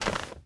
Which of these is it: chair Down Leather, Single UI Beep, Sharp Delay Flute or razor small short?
chair Down Leather